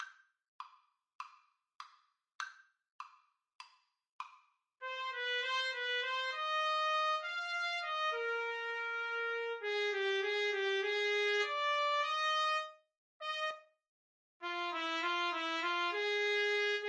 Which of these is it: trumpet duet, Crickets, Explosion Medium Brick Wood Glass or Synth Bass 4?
trumpet duet